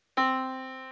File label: Yamaha Disk, piano sound